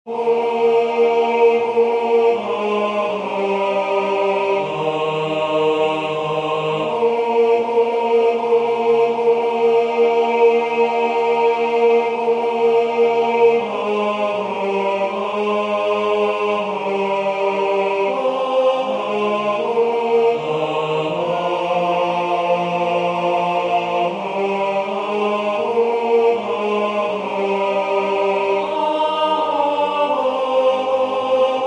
Tenor; Abide with Me

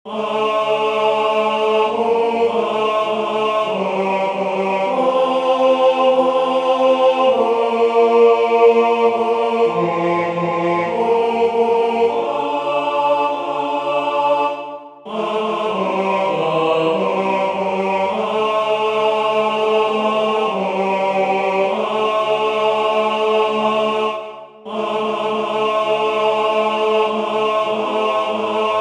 TENOR; Hanacpachap Cussicuinin; Toronto Choral Society

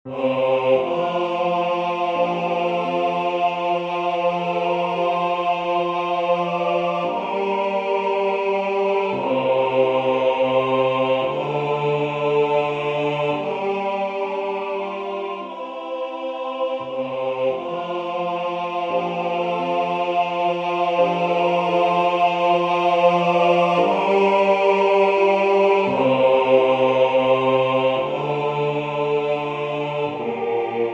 Away in a Manger, Bass; arr. Elmer Iseler

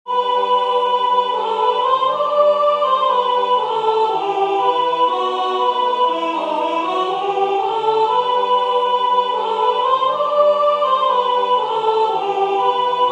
Ukraine National Anthem Soprano Emphasised; Ukrainian National Anthem; Toronto Choral Society

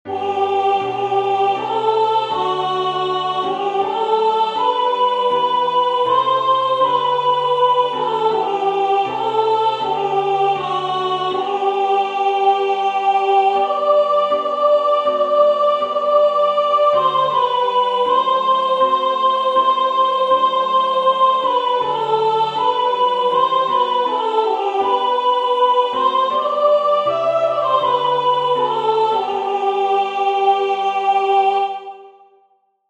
God Save The King Soprano; Toronto Choral Society

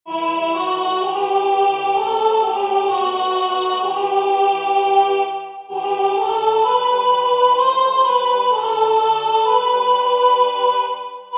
Soprano; Joy on Earth Now Appearing; Modified so that the final chord is Minor

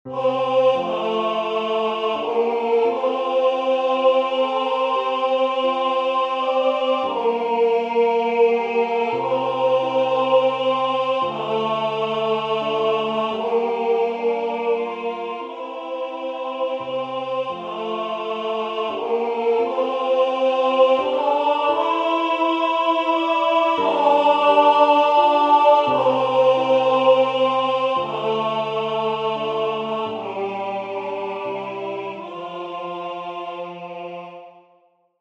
Away in a Manger, Tenor; arr. Elmer Iseler

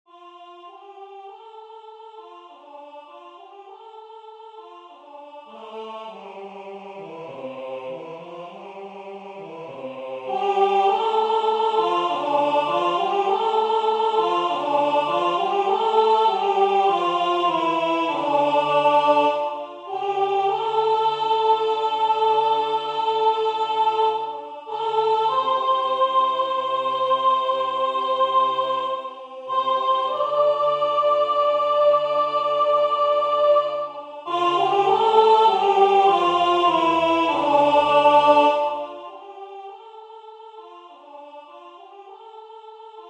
Soprano; A drop of Nelson's Blood; Toronto Choral Society

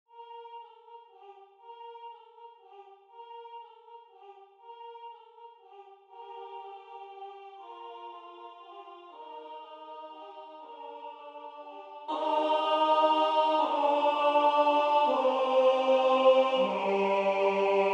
Carol of the Bells Tenor; Ukrainian Christmas Carol; Arrangement by Peter J. Wilhousky

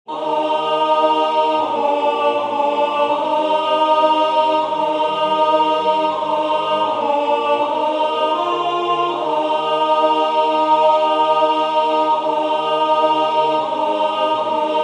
Alto; Abide with Me

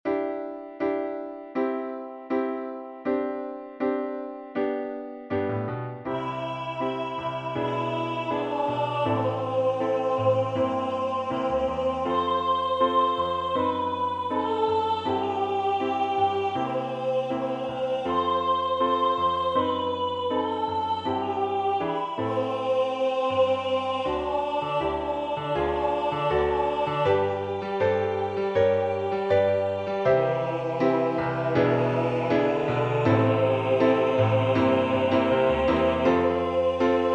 Hymn All Parts

